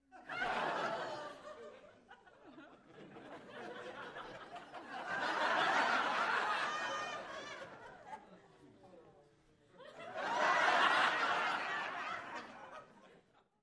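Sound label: Crowd 50 Single Medium, small int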